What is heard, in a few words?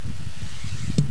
dart, aircannons